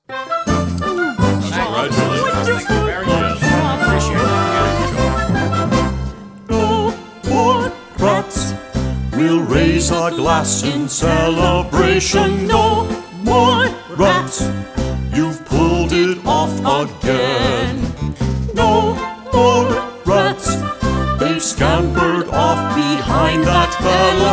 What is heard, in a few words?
No more rats! vocals